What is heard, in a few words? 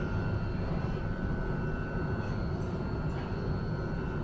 ambiance, Metro